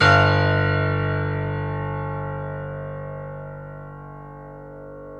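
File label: piano, Grand piano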